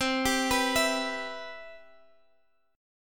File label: C Major 7th, chord; CM7 arpeggiated